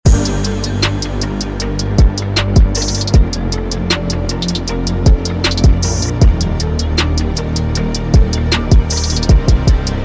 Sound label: start round, music